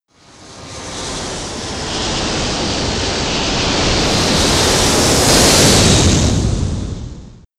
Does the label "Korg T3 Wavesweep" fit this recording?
No